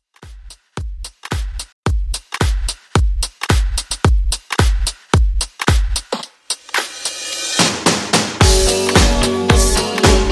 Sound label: 80s Rock, 110 Bpm